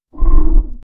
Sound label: a monsters claw attack